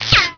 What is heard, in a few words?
zap; PC Gamer IT CD 41; Daikatana